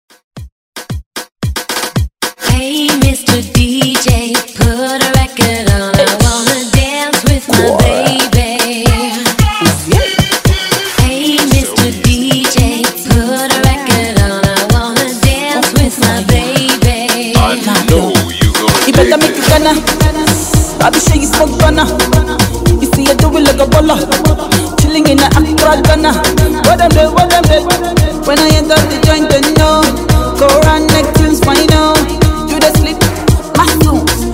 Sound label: Afro Moombah Hype Intro